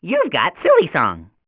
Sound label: silly song, scientist